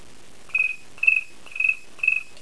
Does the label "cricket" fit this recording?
Yes